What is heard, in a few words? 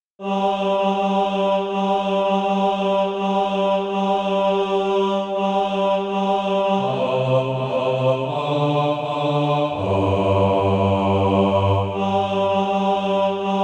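bass, Practise Music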